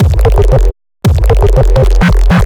bass dive square lfo F

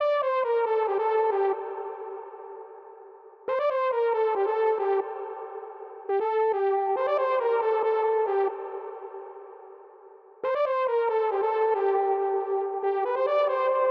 138, Lead Melodic Hook, Am

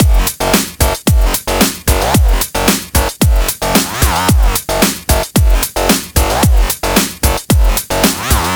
112 F- Music Loops

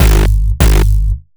bass stab Fm

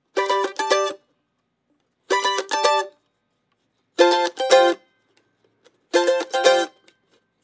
mandolin loop 125

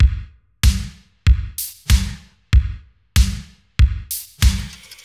Full Drumloop 95BPM

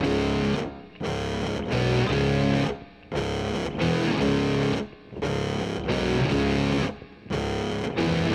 115 C Black Fuzz Backing

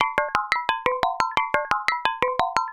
percussion fill system maintenence; MORE GIRAFFES

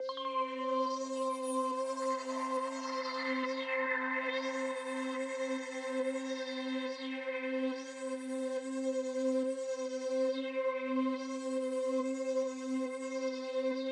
Pad; Crystal

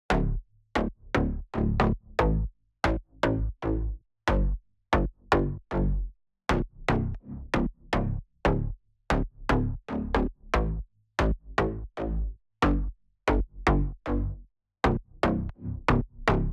Bass 115 Dmin; Reasons

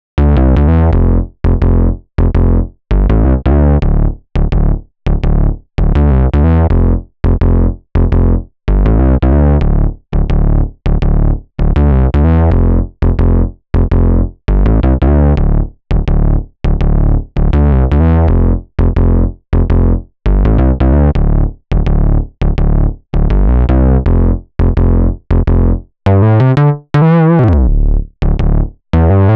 Live Moog Bass

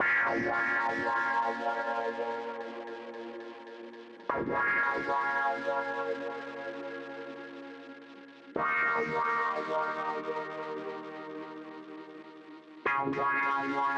clav tape delayed

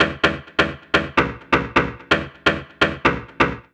128 E Shots Synth

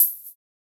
Hat

